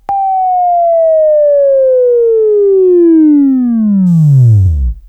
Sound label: Buzz, Sweeping Training